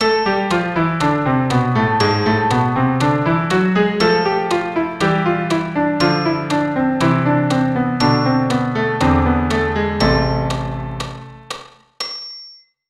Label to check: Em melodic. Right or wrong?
Right